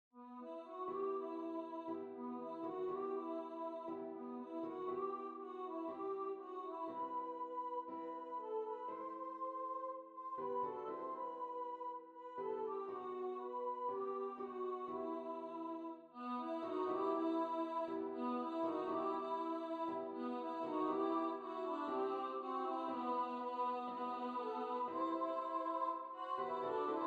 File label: Bella Ciao